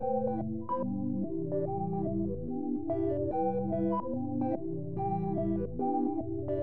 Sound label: TEXTURES Sequence 145 G Major; BBoy Maniac; bboy accents phrases